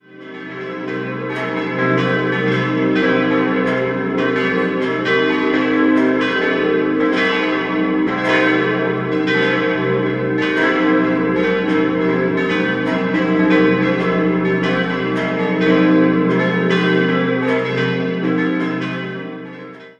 Landau, Glocken

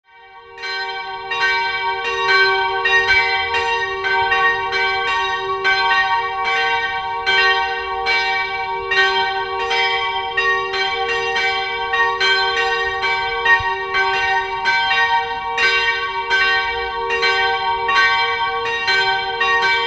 Rappersdorf, Glocken

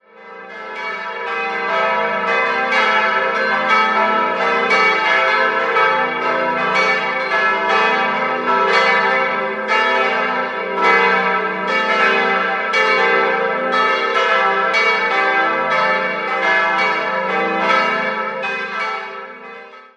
Stopfenheim, glocken

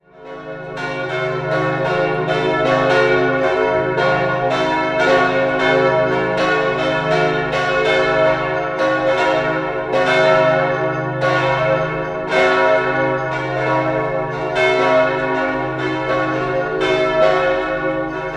Bonn Oberkassel ev, Glocken

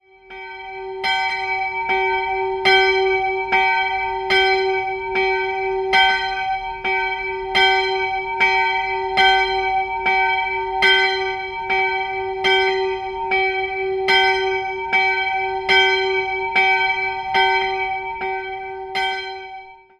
Bad Gögging, Glocken

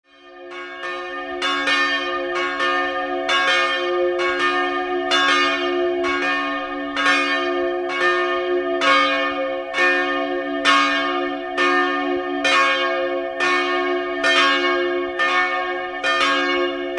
Oening, Glocken

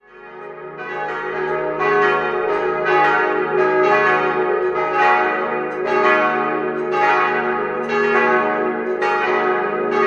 Bergheim, Glocken, Pfarrkirche; Pfarrkirche St. Mauritius; E-Moll-Geläute: e'-g'-a'-h'; Die Glocken wurden 1952 von der Firma Gebhard in Kempten gegossen.

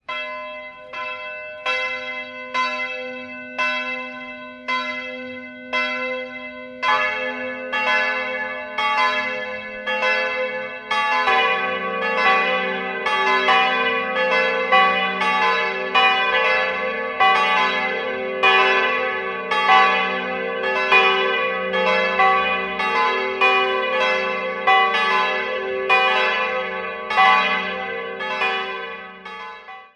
Ihrlerstein, Glocken

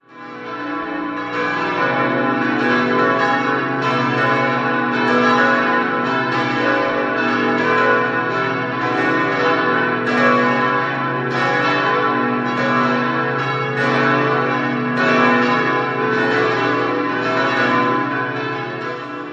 Kitzingen, Glocken, Stadtpfarrkirche; St. Johannes Baptist; 5-stimmiges Geläute: h°-cis'-e'-fis'-gis'; 1950; in Brilon gegossen; Albert Junker

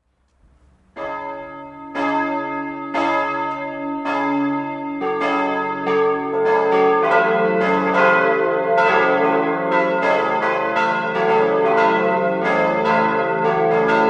Denkendorf, glocken

